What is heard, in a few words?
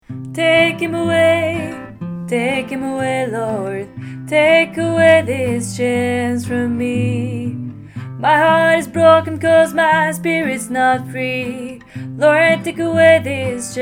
lower voice